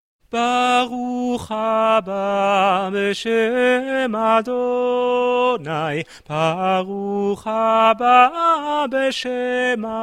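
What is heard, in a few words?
sopran, choir music; BCC choir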